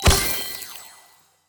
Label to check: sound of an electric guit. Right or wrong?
Wrong